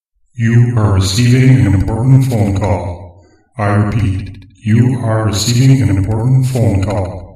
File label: 3d Important Call